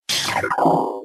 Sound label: Metroid beam change